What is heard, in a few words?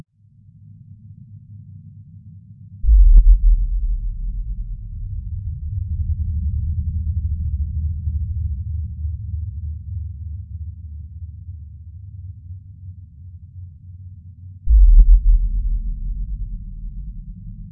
ambient